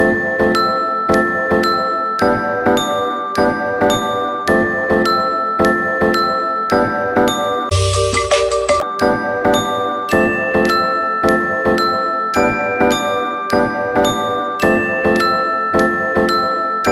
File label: Ringtones; Android; Samsung